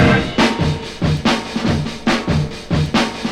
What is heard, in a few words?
144 bpm modern drum loop sample c key